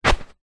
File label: footstep single foot in socks on carpet; zapsplat